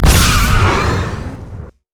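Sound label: overdrive; piltover customs blitz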